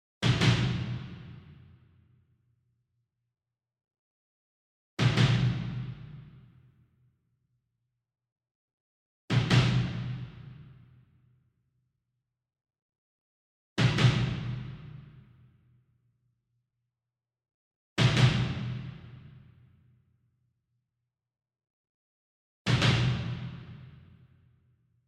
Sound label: Bass Drum Marching Double Hard Beater Far; SINGLE DRUM; boom library; Cinematic Strikes Construction Kit